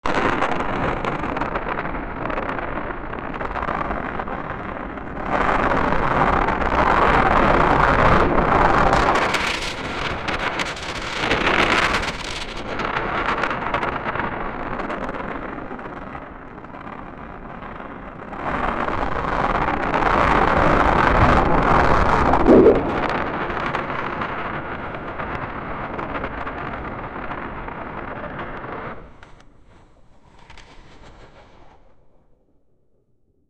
huge cogwheel clear sound

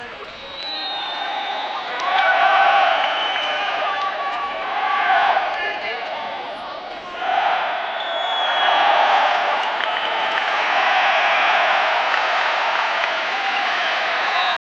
football match

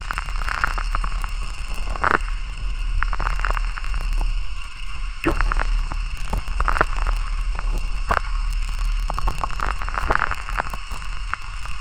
bio horror sounds hydraul; biological

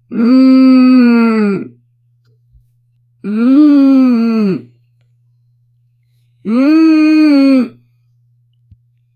human hmmmm

